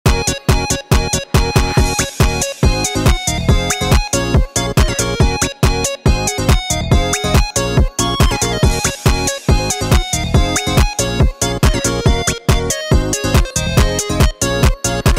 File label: Pluggnb; NCS